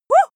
female shout surprised

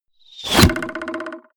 arrow hit; soundpool

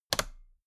toolbox latches close regular double; industrie wirtschaft; handwerk

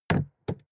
suv gas cap close; verkehr transport; autos

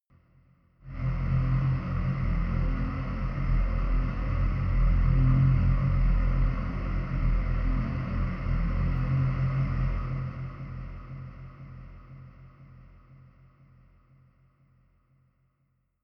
airy choir dark, drones; musikelemente

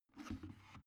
plastic water boiler lift up

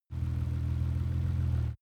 car nissan gt-r 35 idle

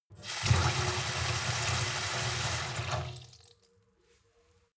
sink fill with water medium; industrie-wirtschaft; gastronomie

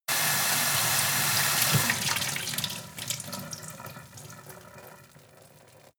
sink water pot hard

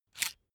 electric drill template slide out medium; maschinen; bohrer; soundpool

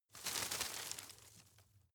rustle medium; berufe; foerster

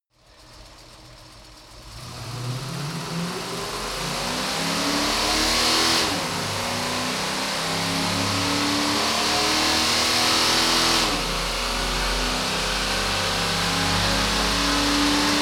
car camaro accelerate decelerate shift engine

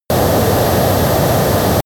plane turbine steady

